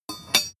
sword blade rattle short

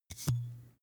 glass bottle handstroke hard long

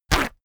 paper towel roll sheet tear off fast

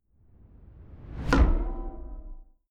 metal weird flute

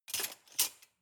cutlery large drawer place soft

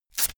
velcro large open fast

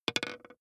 dice small board

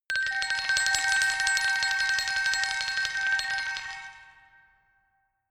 glockenspiel mysterious tremolo high

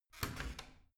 washing machine open

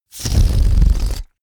balloon air release fart rumble

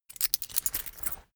key ring mid size pocket put

